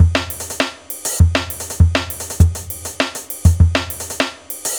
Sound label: drloop, drumloops, Vinyl Attack; Masterbits